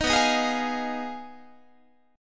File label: B 6th Flat 5th, down, Guitar; B6b5; strummed